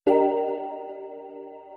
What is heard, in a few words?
confirm